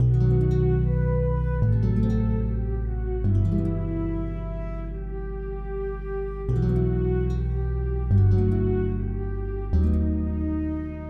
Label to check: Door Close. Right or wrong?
Wrong